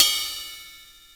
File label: CYMB; Keith Emerson Signature Series